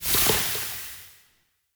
Gas Burst; Ambience; Bromius Inside

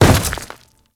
Grineer Step Dirt, Footsteps; Warframe

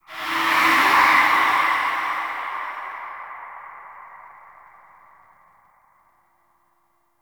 Ranger Stalk Steal B; Warframe

